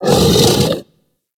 Kubrow Tame Sleep Idle Wake B; Warframe

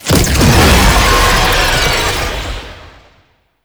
Clone Spawn; Boss; Enemies; Abilities; Warframe; Oraxia

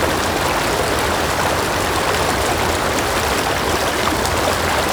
Forest Waterfall Medium; Props; Warframe; Grineer Forest

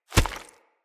Kick Impact Rock; Envoy

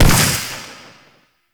Arcane Melee Air Proj Death; Warframe; Mods; Proc

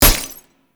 Weakpoint Impact; Enemies; Gameplay; Lasrian Tank; Warframe

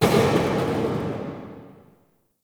Metal Fall; Ambience; Warframe; Corpus Railjack

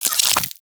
Warfan Unequip, Weapons Melee; Warframe

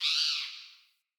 Bird Impact Chirp; Pothia Bird

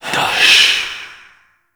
Fire Whispers; Weapons; Warframe; Tenno; Grimoire

